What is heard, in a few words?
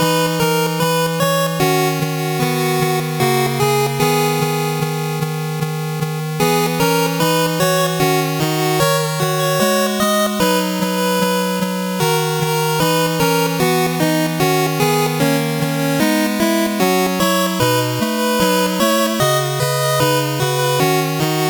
8bit bgm slow